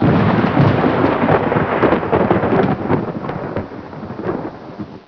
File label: THUNDER, ambience; dod